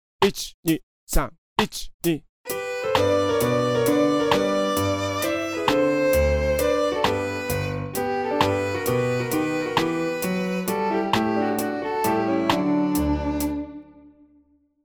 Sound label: Menuett G